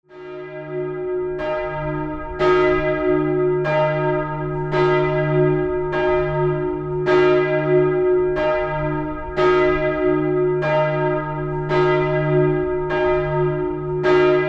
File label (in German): glocke